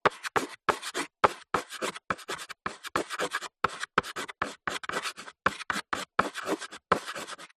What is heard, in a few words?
chalk writing short sentence on blackboard